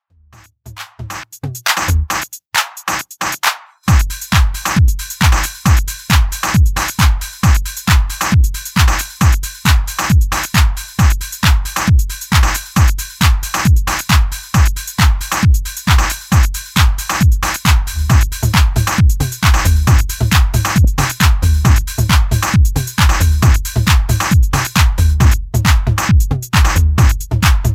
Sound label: funkin with another drum